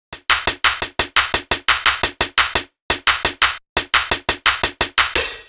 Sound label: Hi-Hat Clap Combo, Hi-Hat Loops